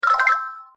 message, notifications; Condor; C8

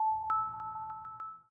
popping colours drag, ui; Samsung Galaxy J3 2016

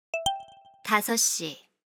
Hourly Alert; SKY IM-U680L

